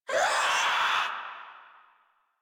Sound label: Infested Crawler Attack Short; Warframe